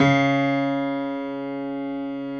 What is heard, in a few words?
C#2, PIANO; AKAI S-Series CD-ROM Sound Library VOL-8